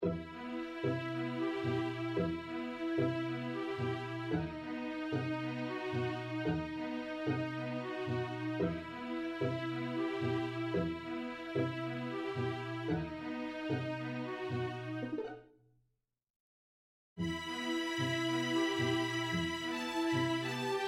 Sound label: Tango